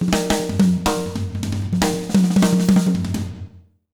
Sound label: BEAT, Jazz Latin Drumloops